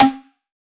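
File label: tight drill snare one shot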